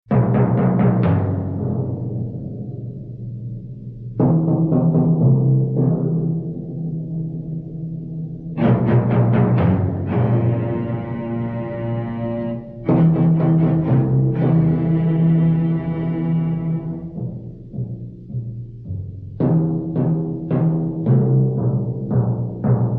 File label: Dramatic Eerie